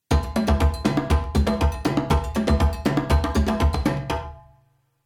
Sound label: Percussion Ensemble